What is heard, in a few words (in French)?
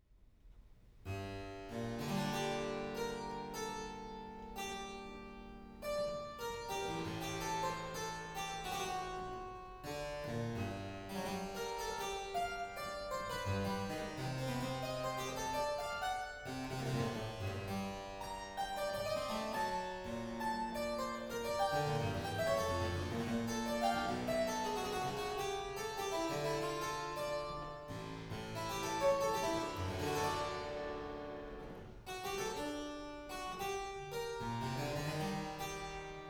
pieces de clavecin prelude